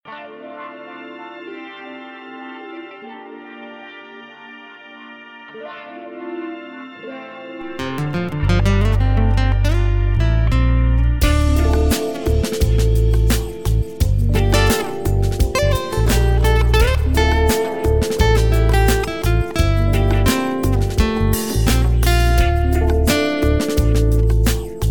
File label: Deep Chillout Jam Track Key Dm Bpm 086 Backing Track; Guitarteamnl Jam Track Team